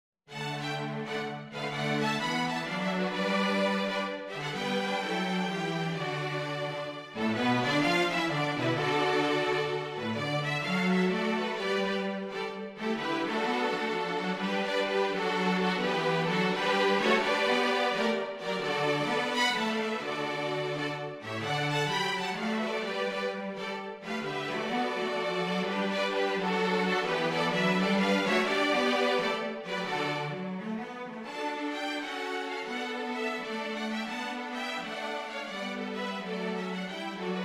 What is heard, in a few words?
String; jazz carol for string quartet